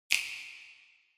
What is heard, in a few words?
Finger Snap Foley Reverb